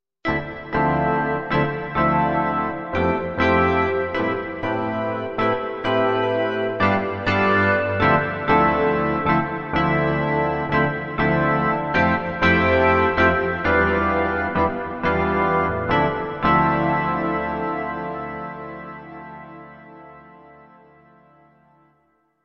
Piano

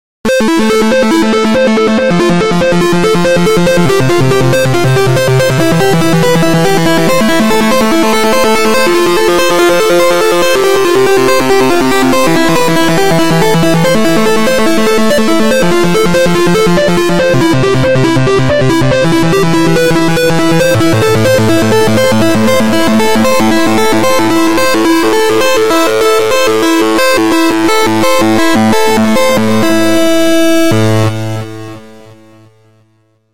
DIST ARP; VAZ

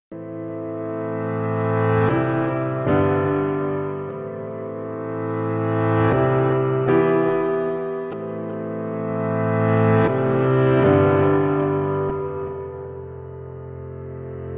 REX PIANOREX

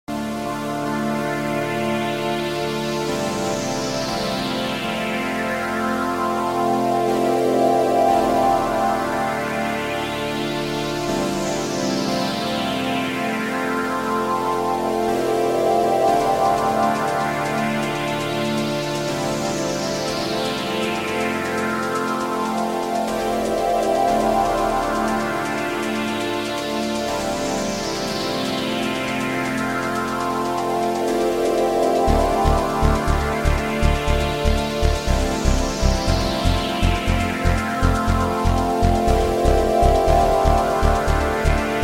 VANGUARD TRANCE

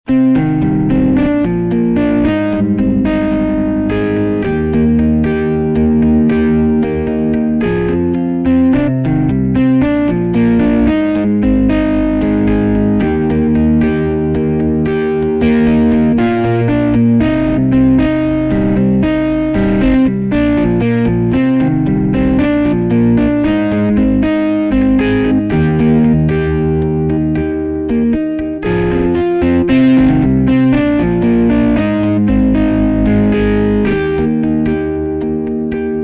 S90 GUIT

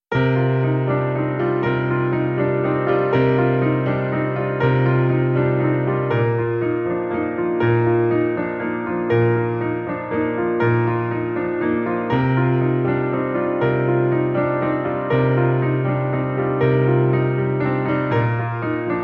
GRAND IMPERIAL SAD

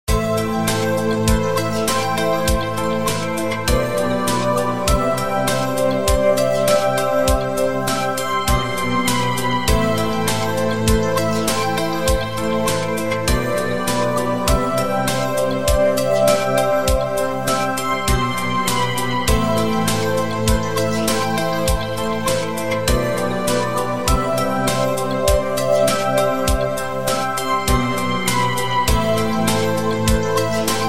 WAVESTATION ORGANIC

